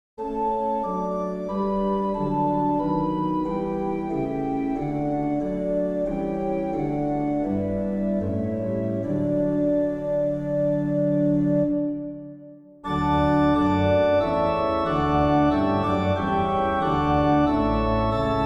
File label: Organ 2 Verses; All You Who Seek A Comfort Sure; St Bernard